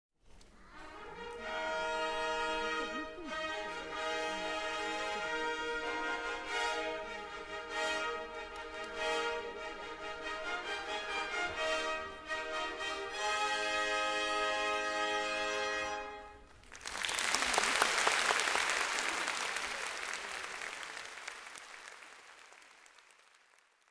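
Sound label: fanfare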